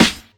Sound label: perfect acoustic snare a sharp key; snare drum samples